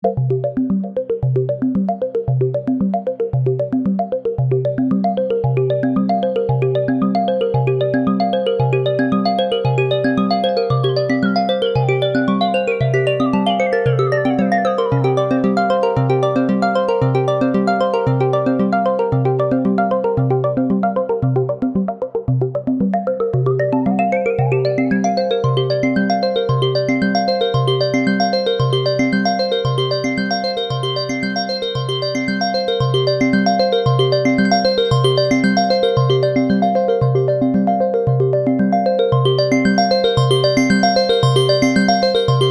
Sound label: Evolving Mallet